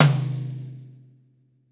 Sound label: V-Pop Tom 47, Roland BK-5 Toms